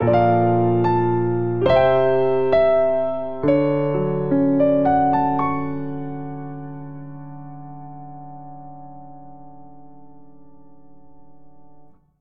Cadence Whole Tone Ascending D Major 77 bpm, Grand Piano; MIDI Clips; Ableton